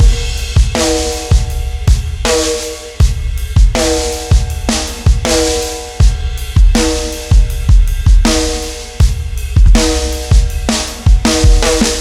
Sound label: Real Beat C, ambient; ambient vol 2